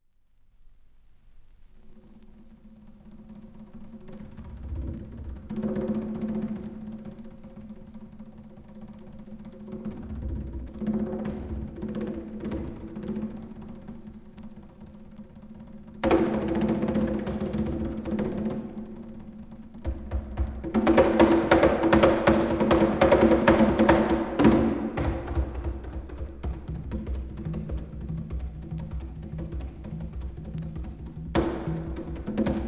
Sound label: Congasolo